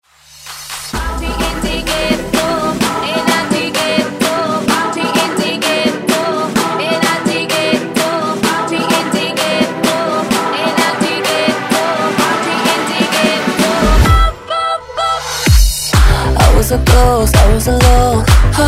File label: Clapella Basshouse